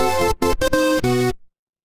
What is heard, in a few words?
retro game game over